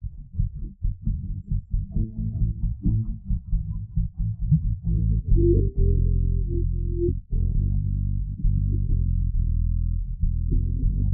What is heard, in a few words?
bass